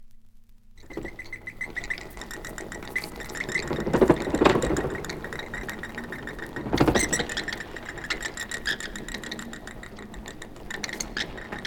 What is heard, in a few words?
bats squeaking and flying